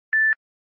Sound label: samsung m01 notification